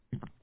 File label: steps indoor; gamejam-22